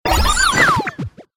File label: Throw; Moogle